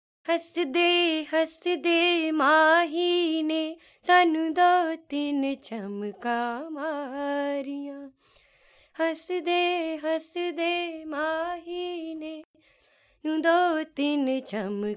India Vocal

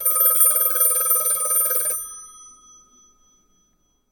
Old Phone Ringtone

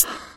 Hi Hat

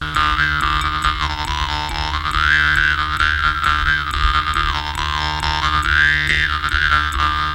Jaw Harp

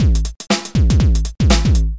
hip hop loop

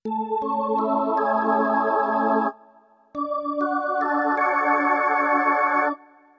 Jazz Organ Soul

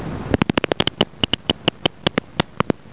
Bat Call Effect

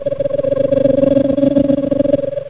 Flying Saucer

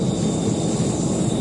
Plane Cabin Drone

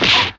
Kung Fu Kick Sound

